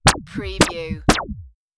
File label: wobble voltage overload